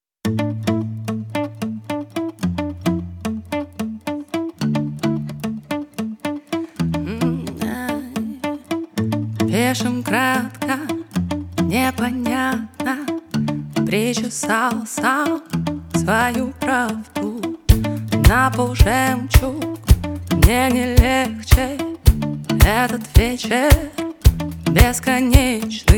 acoustic version

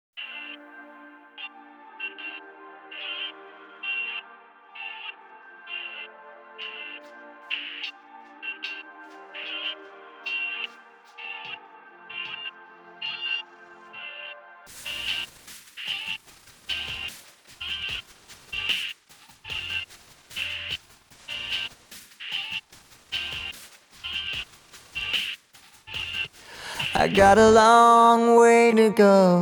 acapella

